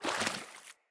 Corrupted Battle Dog Walk Water Step, Foley Footsteps; Animals